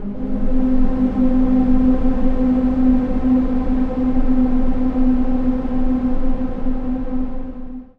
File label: Steampipe Ambient Landscape G 3